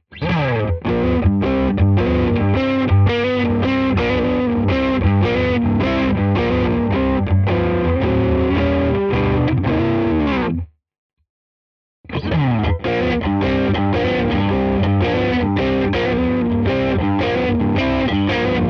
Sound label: TS Crunch Blues